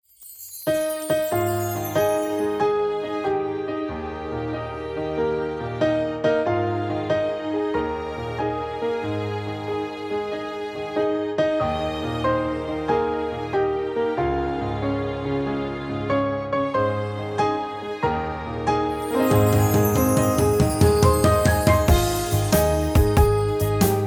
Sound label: happy birthday music